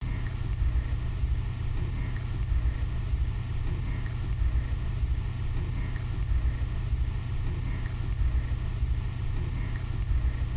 Hospital Room Ambience With Oxygen System Noise

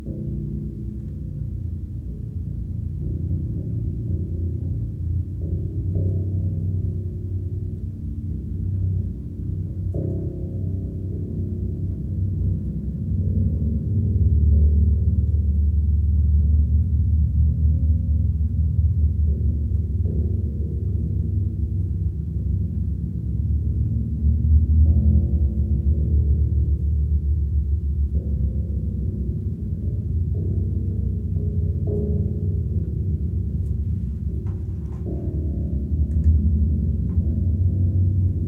Rumble Notes

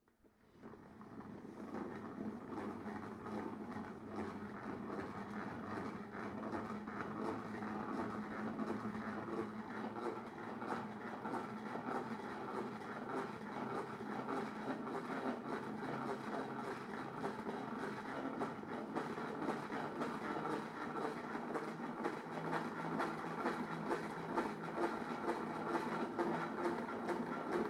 Lettuce Centrifuge Slow Speed Slow

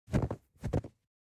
Footstep Snow Single

